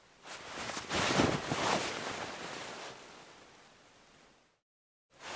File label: other equip, clothing system